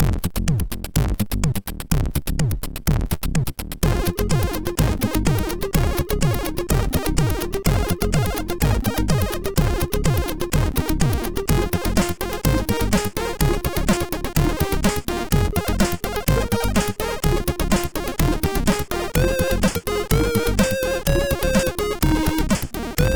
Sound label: Groove Machine, MOS6581R4